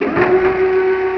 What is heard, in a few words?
elevator start